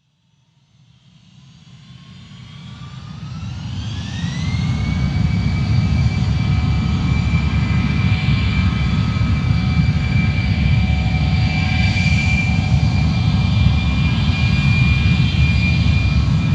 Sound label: Transportation Airplane Jet Rev Engine